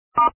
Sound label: DTMF star